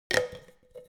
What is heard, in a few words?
object plastic plastic handle; smartsoundfx